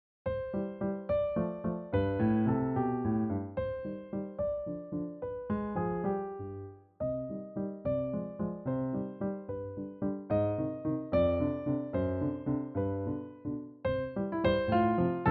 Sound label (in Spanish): Valses